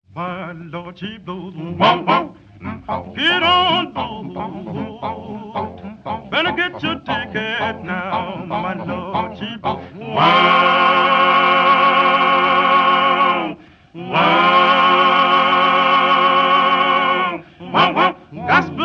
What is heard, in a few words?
Quartet gospel